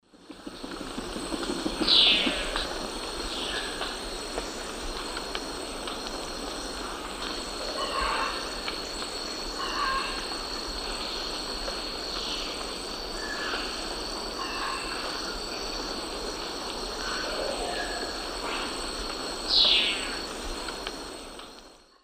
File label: Dixiphia pipra, songs 2 males; S. America; Eastern Lowlands